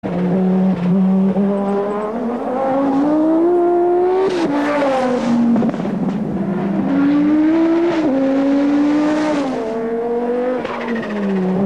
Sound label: audi sport sound